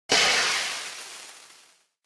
grenade flare delay

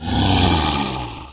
Zombie Raise

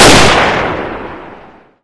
loop, 12.7x97mm Subsonic; Caliber

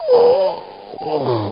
DYING; BattleSNDS

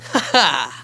LAUGH; battlesnds

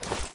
Draw Sniper

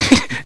LAUGH; BattleSNDS

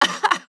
LAUGH; BattleSNDS

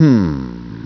humm; BattleSNDS

